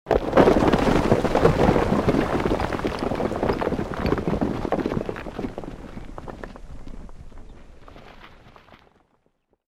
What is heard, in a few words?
created rockslide large, Created Rockslides or avalanches; Rock Sound Effects; Materials